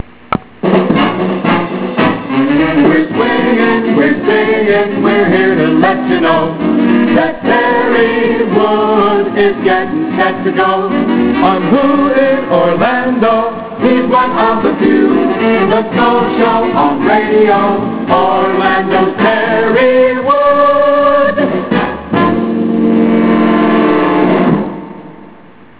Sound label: jingle